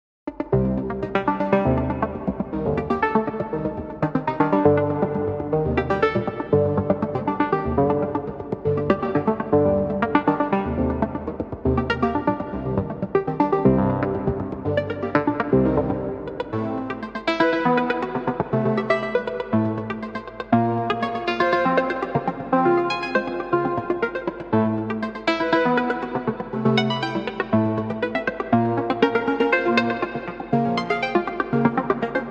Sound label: Low Synths